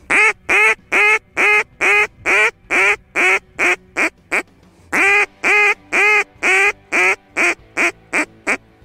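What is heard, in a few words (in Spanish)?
llamada corta distancia, pato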